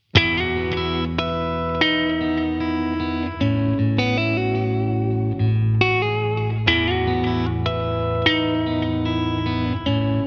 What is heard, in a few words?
COMP ARPEGGIO; Helixシリーズのマルチエフェクター